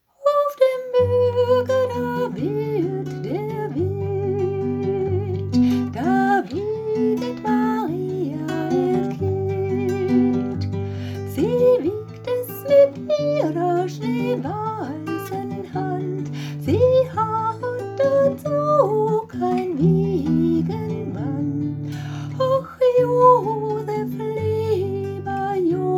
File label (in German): Volkslied aus Oberschlesien